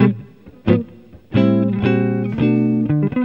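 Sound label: JAZZ GUIT GROOVE; Best Service; World Colours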